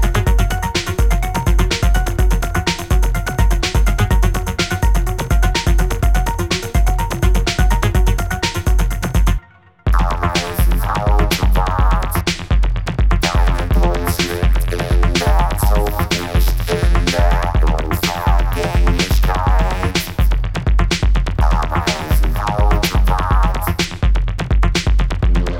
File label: Electro original